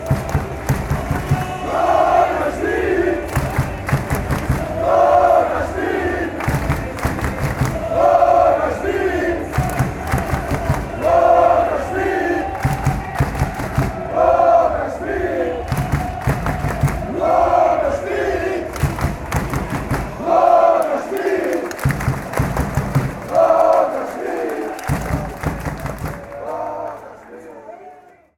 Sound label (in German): Fangesang; salzburg